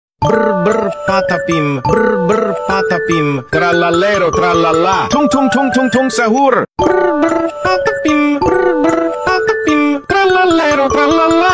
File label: nightcore; brr brr patapim